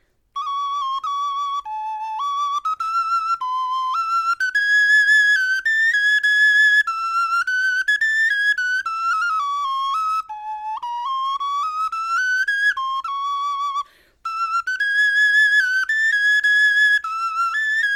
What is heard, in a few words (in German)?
melodie